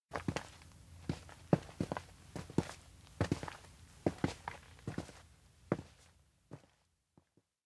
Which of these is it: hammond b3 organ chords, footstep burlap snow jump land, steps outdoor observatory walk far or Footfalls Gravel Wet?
steps outdoor observatory walk far